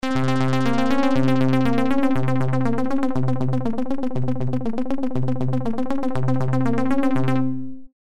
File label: Ping Ponged Arpeggio; Stepattern; syntheway